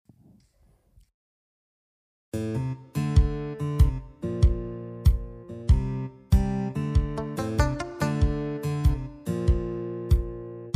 Bigband Duo

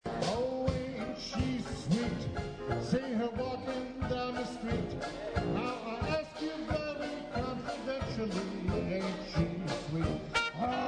Dixieland

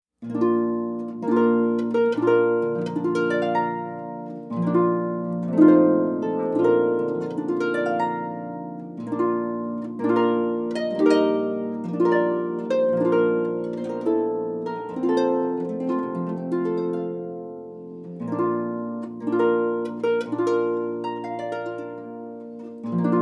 Bridal March; Kirsten Carrell

